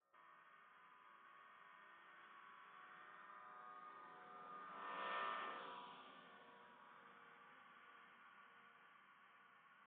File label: indoor Multi Tone, Indoor Multi-Tone AVAS, electric vehicle